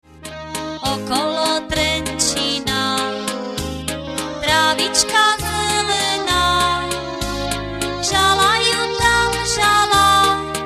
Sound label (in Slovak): akord